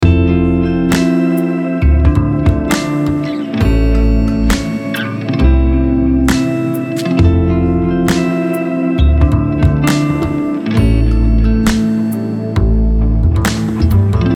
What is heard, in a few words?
Casino Lounge Loop